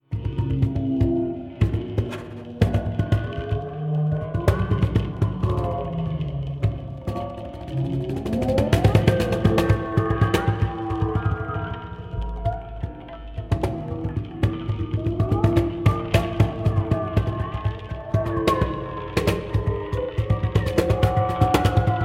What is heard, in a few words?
cajon